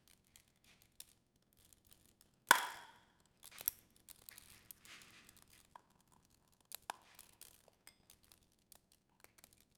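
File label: warm family dinner ambience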